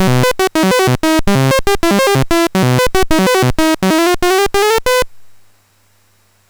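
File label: Pac Man, MOS 6581 SID chip music, games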